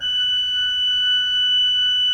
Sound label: F5, Cathedral Organ; ILIO; Propeller Islands Cathedral Organ